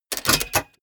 mechanics small rattle bell

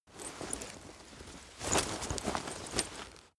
leather metal handling long

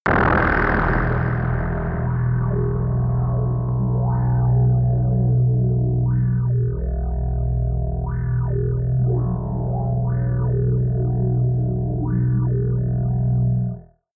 lightning wobble

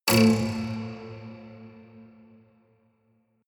haunted strings buzz

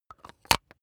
razor battery compartment close hard

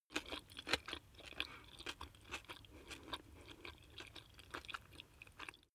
chew spaghetti medium

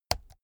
keyboard laptop key press slow hard

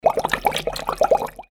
bubble

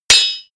sword hit

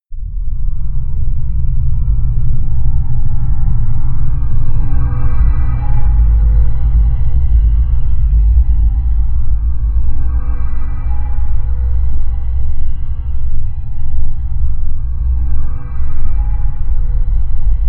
drone panic

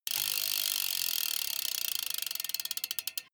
bmx bike freewheel push and slow down stop

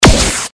future gun shot

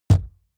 wood impact hollow large

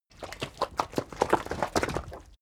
cow defecate

